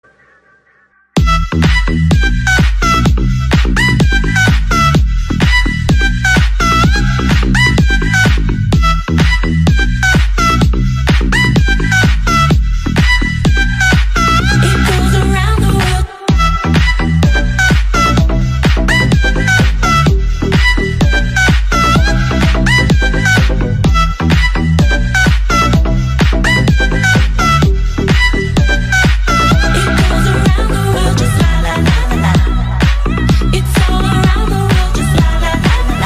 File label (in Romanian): Muzica cu bass puternic